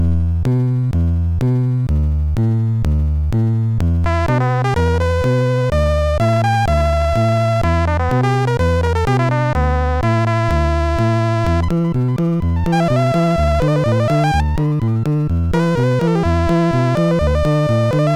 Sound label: Hammonds Organ, MOS6581R3